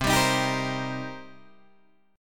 C Minor 7th C Guitar Open D

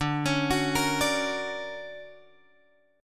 D Augmented Major 7th, guitar

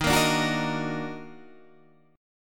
Eb Minor Major 7th Eb down, chord; EbmM7; strummed; JGuitar

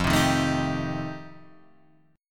F 9th F Guitar Half Step Down, chord

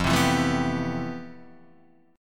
F Major Sharp 11th Guitar DADGAD, chord

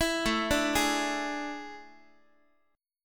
A Major 7th Suspended 4th A, Guitar; AM7sus4; arpeggiated; x,x,7,7,6,7

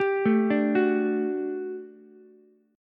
G Major 7th Suspended 2nd G down Ukulele; GM7sus2 arpeggiated

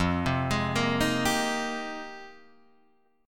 F Minor 11th, Guitar DADGAD

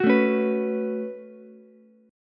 A 6th Add 9th A down Ukulele C6; A6add9; strummed; A6add9 chord; JGuitar

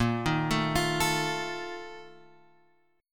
A sharp Major 7th Suspended 4th, Guitar, Open G

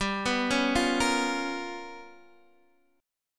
G 7th Sharp 9th Flat 5th, Guitar, Open D

